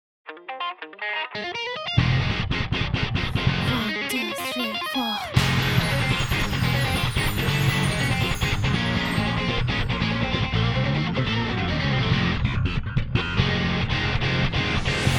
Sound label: Drums MinusOne; NCS オーディション楽曲